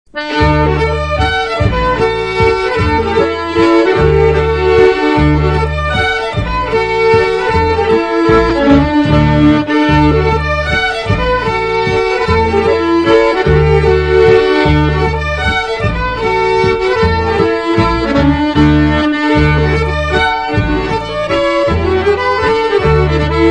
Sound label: Mazurka